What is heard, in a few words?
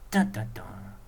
Ta ten ten